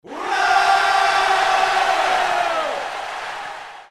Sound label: ura; noviygod